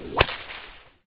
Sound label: whip crack; hedgewars